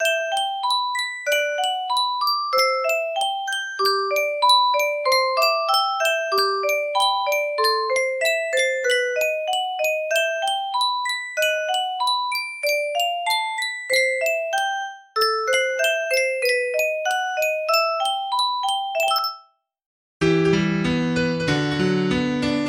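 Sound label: Ballad, MIDI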